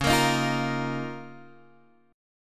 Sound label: Db 6th Add 9th Db down Guitar Open G